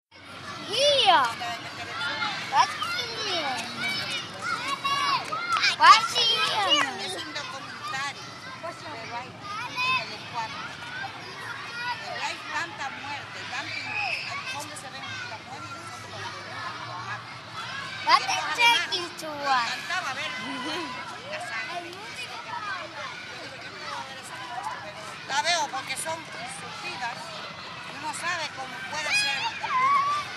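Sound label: Spanish Kids Playground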